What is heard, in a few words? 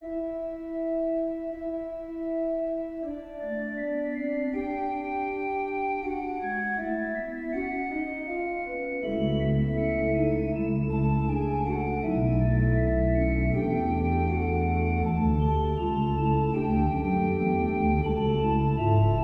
Organ, Kanon; aJHR Organ Book VI Kanon Nun komm